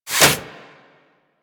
Mods Working To Sleep; Warframe; Liset; Hub; Machines; Tenno Mods

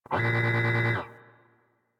Research Facil Repair Robot Lift; Warframe; Ambience; Venus Open World; Objective Rooms; Props One Offs

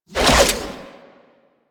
Grineer Crew Ship Pilot Finisher Leap; Warframe; Rail Jack

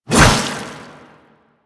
Hover Whizzby Sorrow; Enemies; Warframe; Warframes; Pax Duviricus

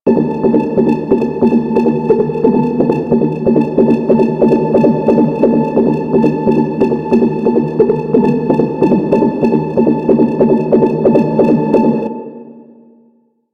Space Ship Mine Projectile Loop; Warframe; Grineer Pursuit Space Ship

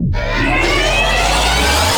Mega Laser Charge Up; Teralyst; Ropalolyst; Abilities

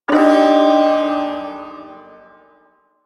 Ersatz Shawzin Min Chord

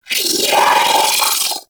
Sentient Vocal Attack; Warframe; Sentients; Battalyst

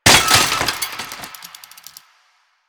Bicycle Hit; Warframe; Ambience; Nineteen Ninety Nine

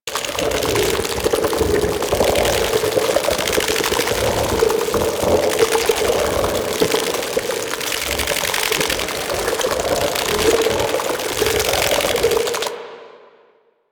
Scarab Swarm Loop; Warframe; Warframes; Mummy

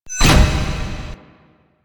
Stairs; Warframe; Entrati; Vaults

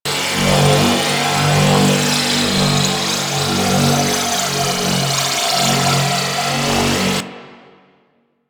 Crp Ship Prophet Coin Loop; Ambience; Props; Warframe; Corpus Ship Remaster

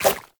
Baby Jump; Warframe; Nokko Colony; Visions

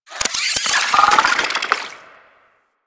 Infested Weakpoint Vocal Chatter; Warframe

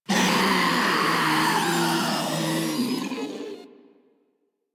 Proto Infested Ancient Death, Enemies Vocals; Warframe

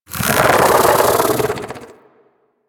bug vocal alerted; Warframe; Hunting Critters; Inf K Drive Bug

